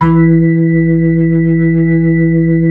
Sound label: B-3, Blues, Keys of the 60s and 70s; Roland LCDP10 Keys of the 60s and 70s 2